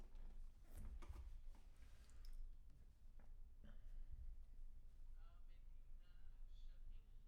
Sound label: Bass Room